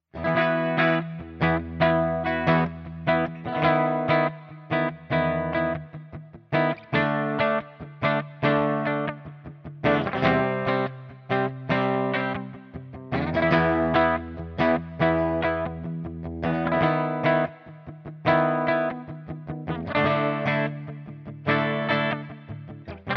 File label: Schecter C-1 FR-S Bridge Pickup Clean